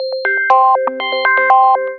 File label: Alarm; Samsung SGH-i450v